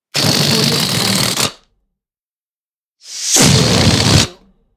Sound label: balloon deflate